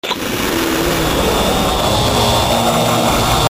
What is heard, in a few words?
large mouth; sonic exe 2011